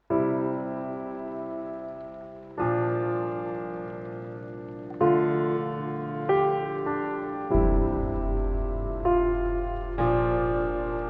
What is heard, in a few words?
melancholic are moved